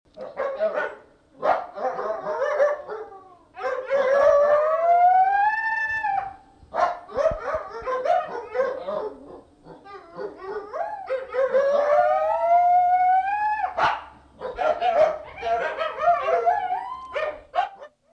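Koyot Canis latrans Kollektivnyy lay i voy treh samcov podrostkov, coyote collective barking and howling of three adolescent males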